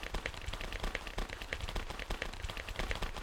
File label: Barefoot Run; MiSide